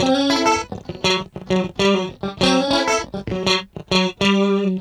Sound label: Guitar Loop; Guitars; Ambient Grooves